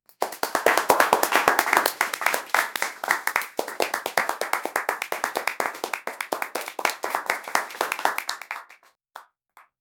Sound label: group of several people c, audience